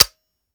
weap fire first; gmod; viper; weapons; scharlie

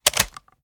sm uzulu reload empty xmag mag out; reloads; iw8; gmod

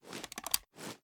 sm uzulu reload empty xmag tilt; reloads; iw8; gmod

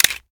reload xmag stock mag out; gmod; pi mike

